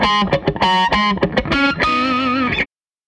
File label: Tele Guitar Loop